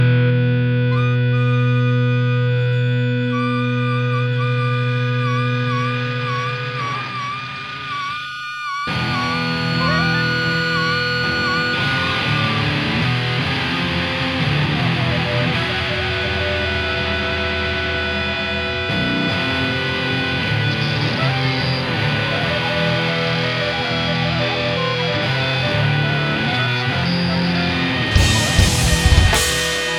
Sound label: saxophone vocals